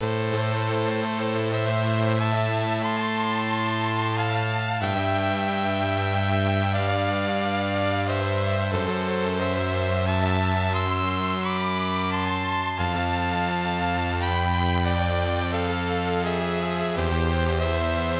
Defeat; Gaia no Monshou; Masaya; PC Engine

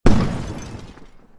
Explosion; Wild Animal Racing

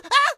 take damage small; Scrat

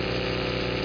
Engine; Buggy; PS1

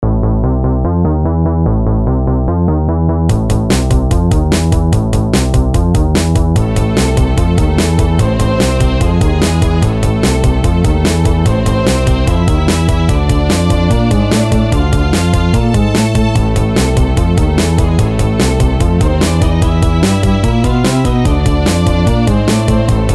Gameplay (Loop Only); vitamin z ps vita gamerip 2015

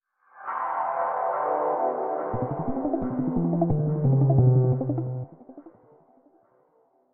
Night Start; Five Nights at Freddy's The First Generation

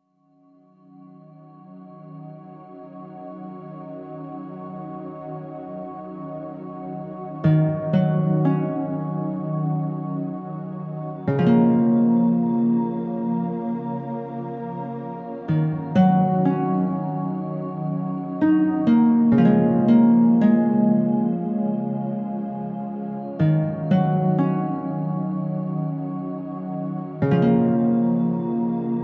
Forest Ambient Theme; Forests of Augusta

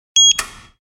machine beep

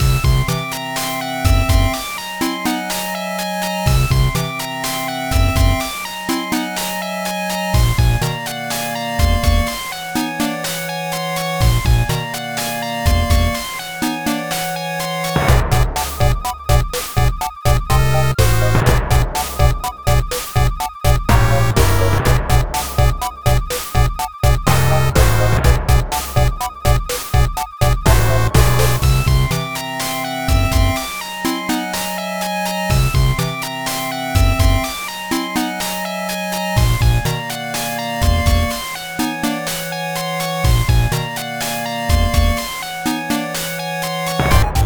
BGM CAVE

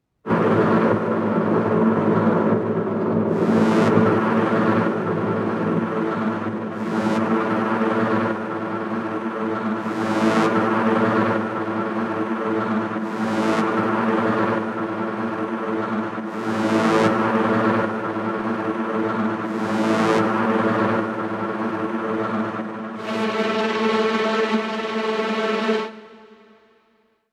Boss Fight

